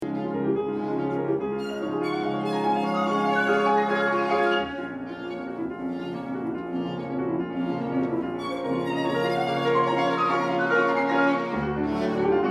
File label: Violin and Piano; Alkan; Grand Duo